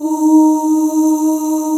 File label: D#3, Basic Pop Oohs